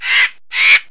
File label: VULTURE